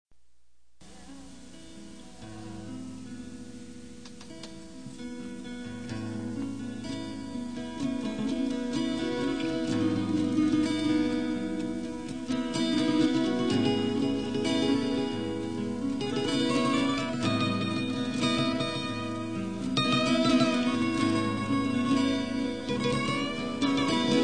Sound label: Smooth Guitar, instrumentals